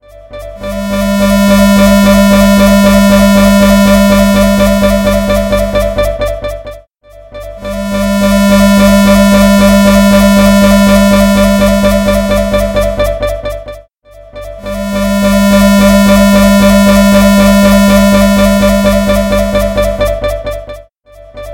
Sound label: Alert Clock Extreme